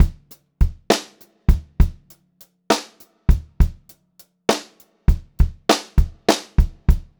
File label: Pop-Rock Drumloops, 100